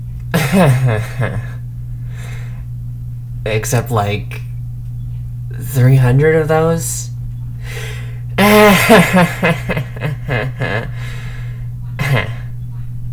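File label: hehehehehehehehehehehehehehehehehehehehehehe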